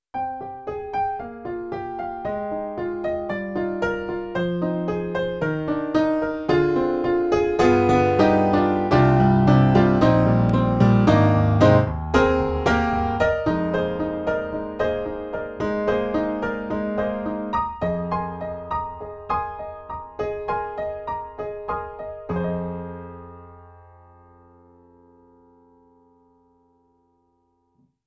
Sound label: E-MU Holy Grail Expansion ROM, Rocker